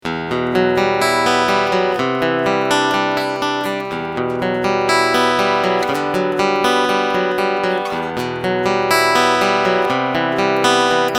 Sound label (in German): pickinglinie piezo; dimavery stw-60